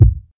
natural kickdrum sound a sharp key, kick sound tuned to the A# note